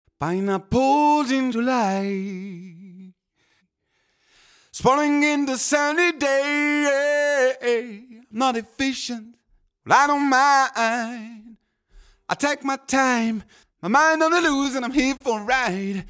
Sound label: Male Vocal; Pulsar 8200